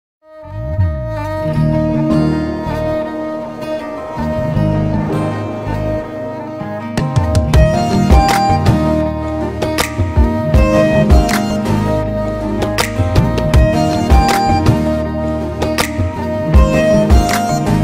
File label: BGM ringtone